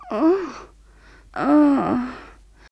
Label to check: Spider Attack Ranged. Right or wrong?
Wrong